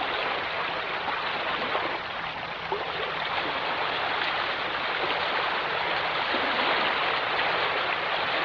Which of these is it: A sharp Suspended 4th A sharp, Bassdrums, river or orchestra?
river